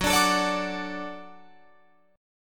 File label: Gb Minor Sharp 5th Gb down Guitar Open F; Gbm#5; strummed; JGuitar